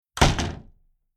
Household Door Old Spherical Latch Slammed Closed Glass Window Rattling; Tom Hutchings